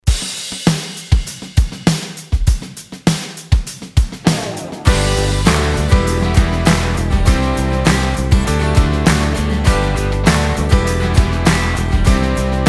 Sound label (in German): Ich bin dabei kids Song Playback